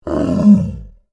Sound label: Monster Groan, Horror, Monster, Groan, Creature; Monsters (Sound Effects:Horror); BlastWave